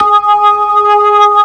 ORG VK, B-3 ORGANS Vinta Keys B3

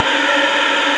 Alto Breath; Proteus 2000; Digital Sound Factory Proteus 2000

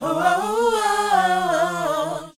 SHOO B A# E, Vocal, R&B Dance; Spectrasonics Vocal Planet

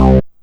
Synth Hit C; Zero-G Trance Inducer; 90 s Sample CDs

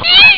ihhhh!; ST-XX Amiga Soundtracker Sample Packs

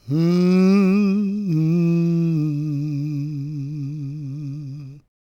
GOSP MALE, SOLO; Gospel

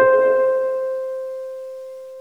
HARP C4

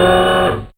Motor; Yamaha DTXpress; Effect 1

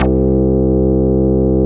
SYN BS3; Greytsounds - Scott Peers Sound Engineering

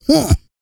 GRUNT, MALE HUMAN; Spectrasonics Vocal Planet

